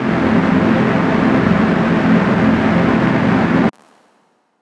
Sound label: SAW F3 MAJOR